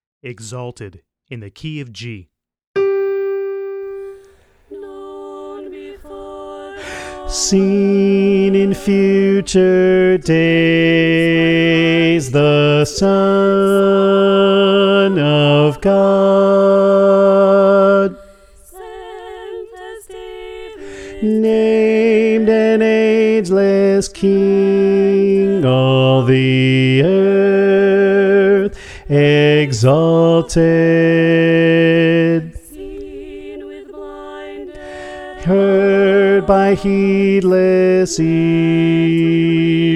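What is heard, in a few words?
Bass, choir part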